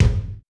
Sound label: kick, house